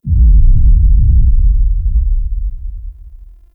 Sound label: Undercroft Sub Sweetener; Duviri